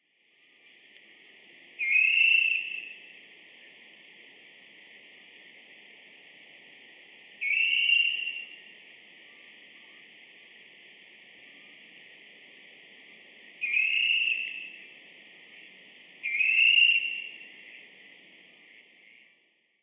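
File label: kuina, Water Rail; クイナ; 地鳴き; 栃木県渡良瀬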